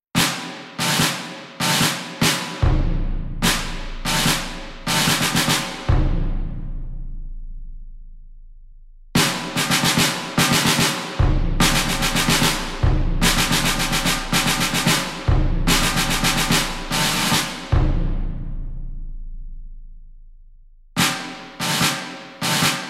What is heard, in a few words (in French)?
CAISSE CLAIRE